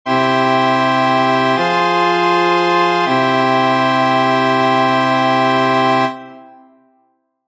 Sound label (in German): c-dur As